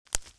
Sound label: Twig Breaking